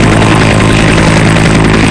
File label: COPTER